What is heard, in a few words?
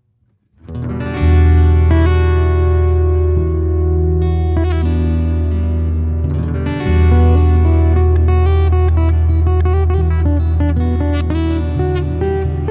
Acoustic Guitar; Sound Quality Test